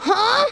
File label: pain; trunks kid